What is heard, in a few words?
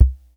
KPR KICK, Korg KPR77